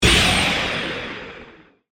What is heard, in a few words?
Hit Burst